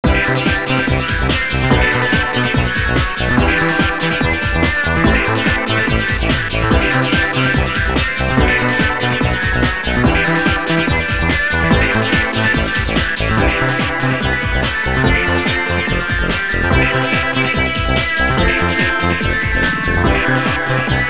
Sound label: Powerful Rhythm; famima